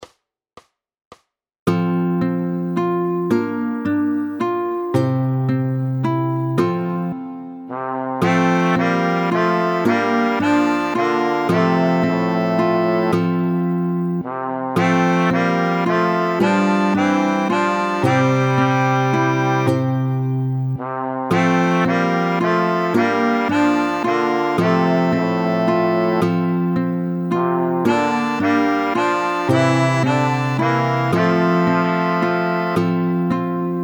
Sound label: Ukulele; My Bonnie is over